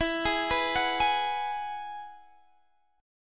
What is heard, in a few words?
E Major Add 9th, Guitar Half Step Down